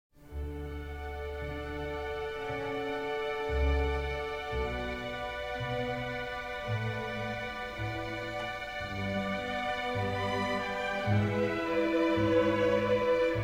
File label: Orchestral Suite in D Major, Air; Orchestral Suite No. 3; BWV 1068; Air On the G String